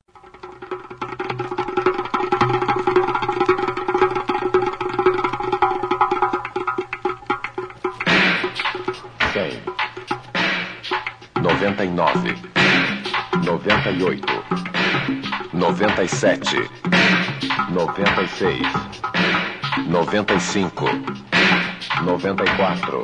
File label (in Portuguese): vinheta